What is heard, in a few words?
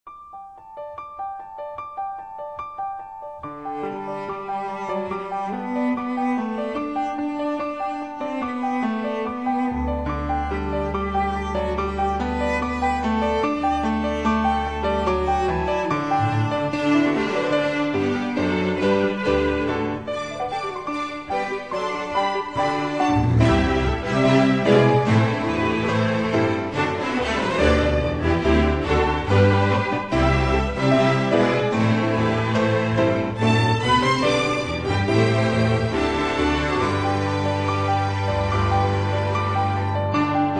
Strings, Christmas